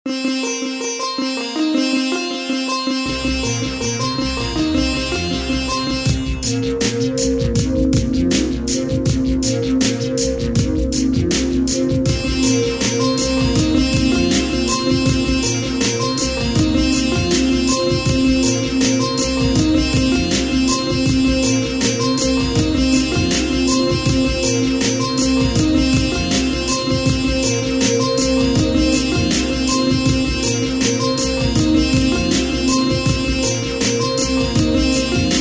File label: Indian Ethnic Fusion Sitar Drums DJ